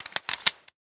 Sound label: m92 clip in; Rust